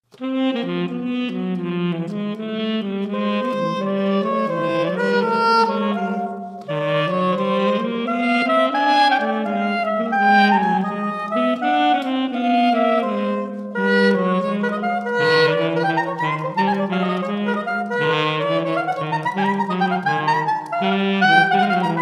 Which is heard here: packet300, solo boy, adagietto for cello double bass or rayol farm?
adagietto for cello double bass